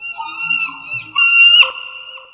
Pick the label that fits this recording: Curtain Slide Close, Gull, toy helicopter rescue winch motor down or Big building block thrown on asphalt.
Gull